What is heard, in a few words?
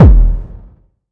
Kick hard